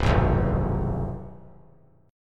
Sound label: C Major 7th Suspended 4th Sharp 5th, down; CM7sus4#5 strummed; JGuitar